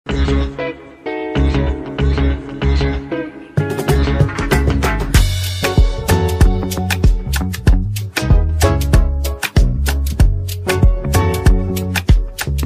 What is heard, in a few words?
Instrumental